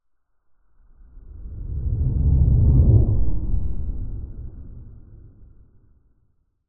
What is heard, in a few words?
science fiction spacecraft fly past internal pov; zapsplat